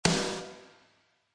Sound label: Rcd Snare, DrumKit; REAL LIVE KITS; Osama Qureshi DrumKit